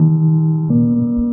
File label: bass organ, Loops